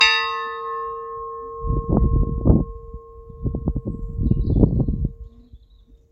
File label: bells; didling